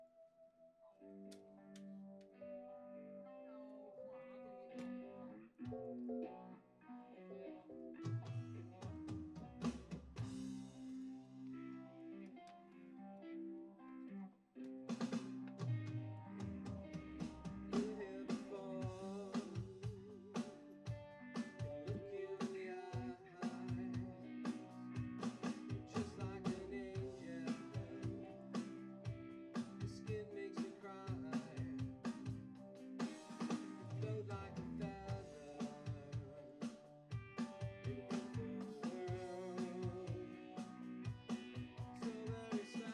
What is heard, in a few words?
Vox; JASS Session